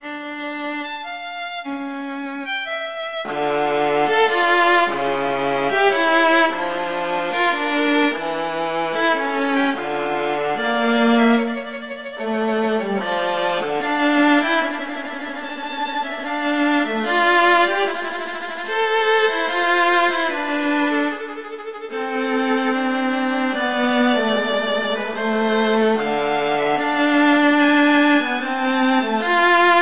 Adagio; Telemann; Canonic; Sonata 1

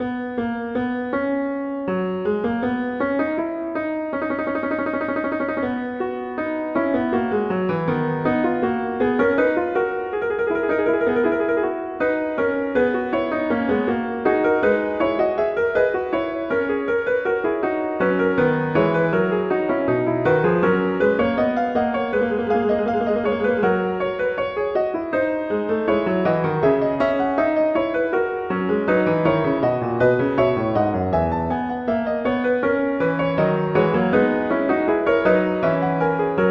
Fugue; Bach; Prelude Fugue XXIII

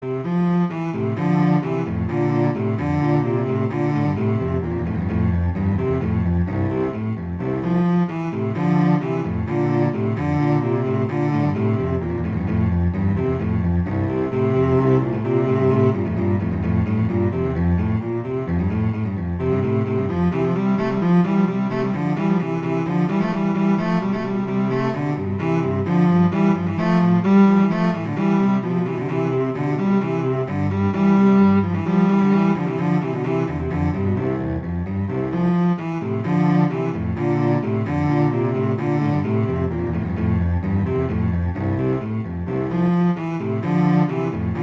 Allegro; Telemann; Canonic; Sonata 1

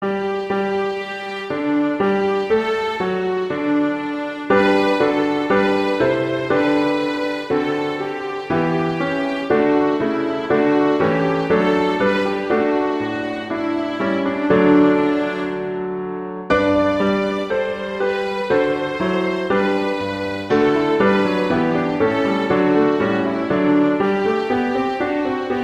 O Come All Ye Faithful Adeste Fideles; Christmas

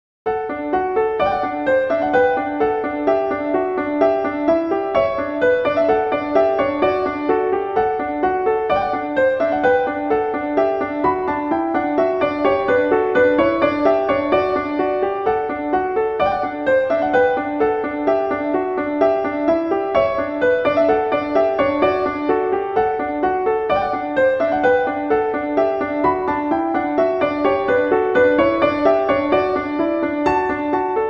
Gavotte; Bach; Eng Suites; Suite VI

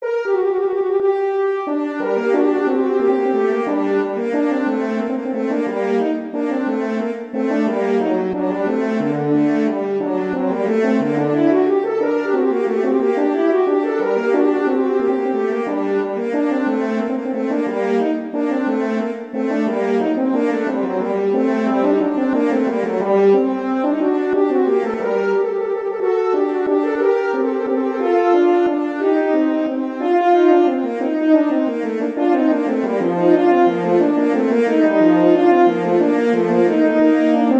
Vivace, horns; Telemann; Canonic

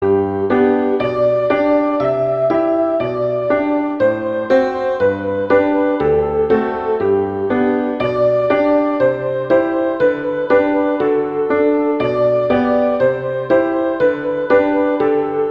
Twinkle Twinkle Little Star; Christmas

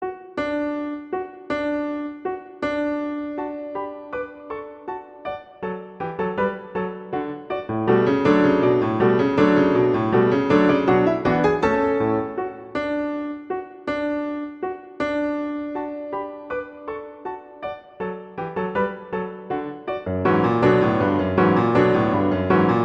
Allegro; Arensky; Enfantines

